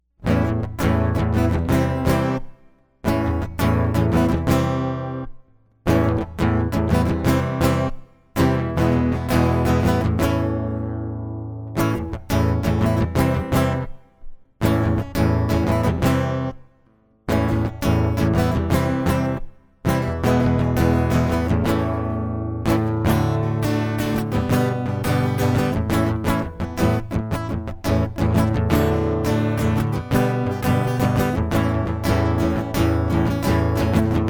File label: acoustic; Dont Hold Out